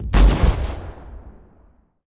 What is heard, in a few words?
canon fire; fallguys